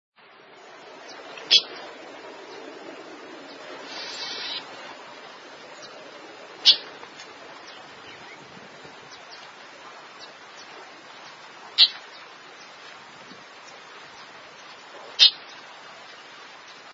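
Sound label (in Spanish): voz calandria real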